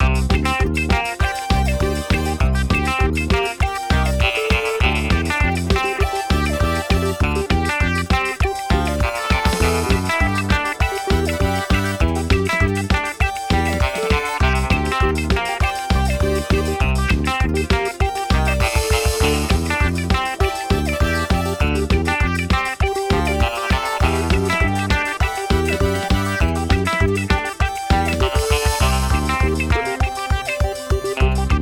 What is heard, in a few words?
Mailroom 200bpm, Loops; Album by a local Atlanta band